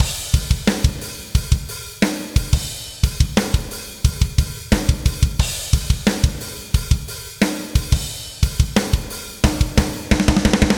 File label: Drums and Jingles Exploracion Drums (redoble); Metal Tales Fury of the Guitar Gods